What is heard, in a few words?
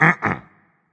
disagree berserk; cuchulainn